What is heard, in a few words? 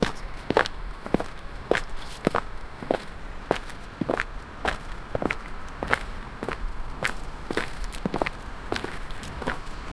footsteps walking on the